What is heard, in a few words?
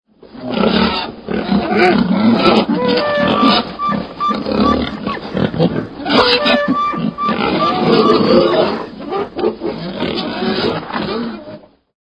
Elkhog Grunt Bugle